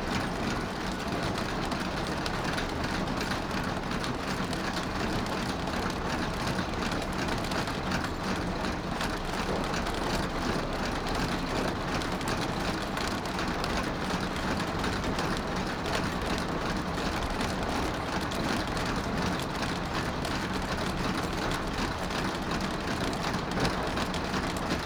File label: quarry mechanics loop; Rust